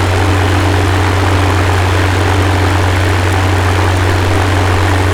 Aircrafts Engines Packard Merlin Prop; DCS

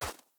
wolf footstep snow hard; Rust

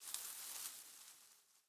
leaf rustle grassy med

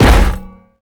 impact metal heavy

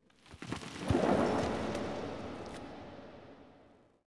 city occasional

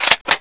Inf Weapons Foley 3rd; Red Orchestra 41-45

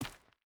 footstep burlap stones run; Rust

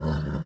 Horse Fort Vox; Civ4 beyond sword

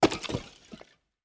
ambient; World of Tanks

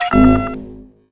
adrenaline gain

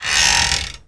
cable strain

